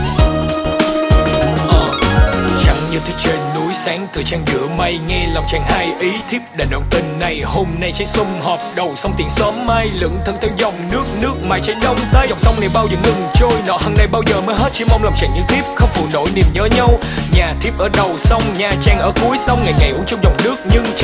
Nhac Chuong Rap

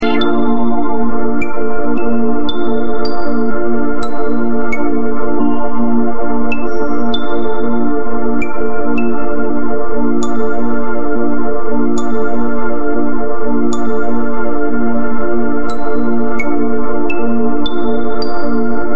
nhac chuong nokia e72

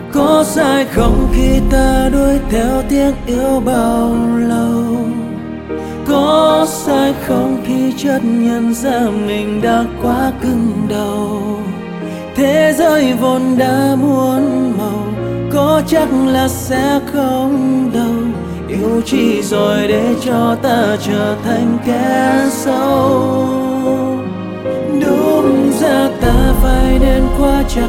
Nhac Chuong; nhac tre

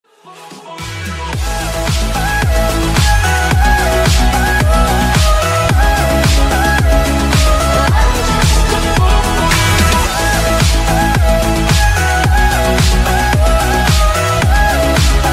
không lời; nhac chuong

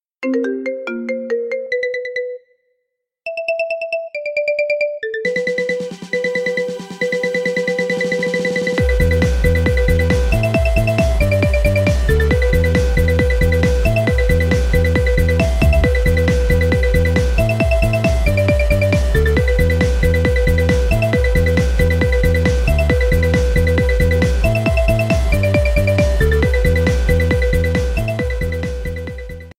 Nhac Chuong iPhone 14 Pro Remix Marimba

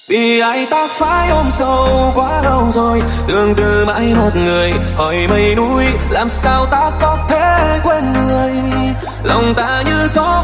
Nhac Chuong Remix

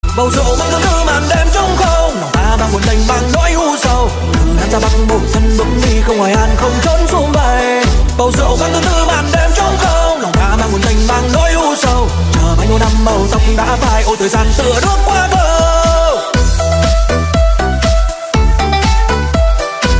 Nhac Chuong Remix

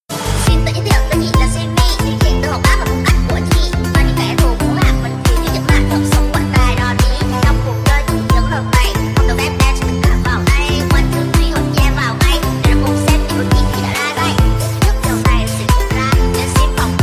Nhac Chuong Remix Hot Tiktok

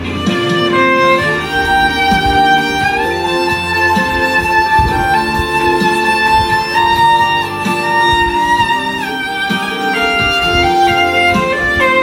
Nhac Chuong Violin

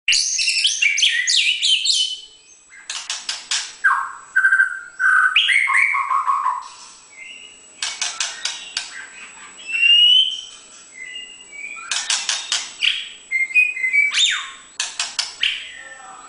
Chich choe hot goi mai; nhac dong vat

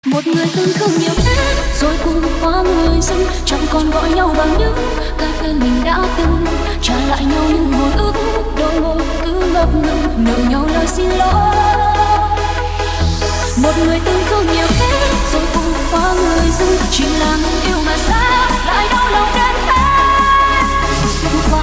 nhac chuong remix

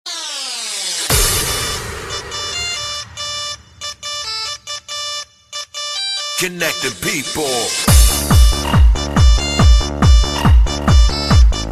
Nhạc Nokia Phiên Bản DJ; nhac chuong

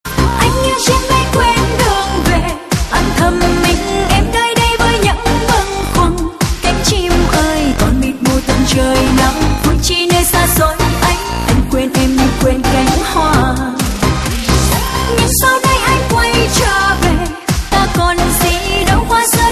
Remix Nhac Chuong

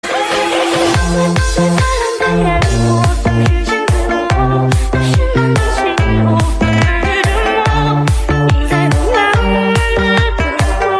nhac chuong remix tieng trung

